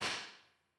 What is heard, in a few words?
AKG D112, Impulse responses; Henry Olonga; The Beautiful Room